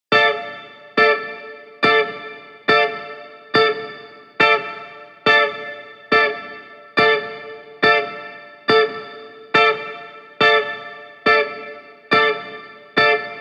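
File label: 140BPM D minor Guitar FX, Offbeat Dub Guitars; Antidote Advent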